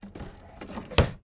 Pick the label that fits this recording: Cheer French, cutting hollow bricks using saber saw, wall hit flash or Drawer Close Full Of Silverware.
Drawer Close Full Of Silverware